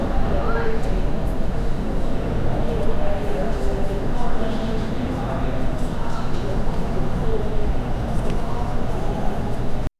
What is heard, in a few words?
outdoor hotel ambience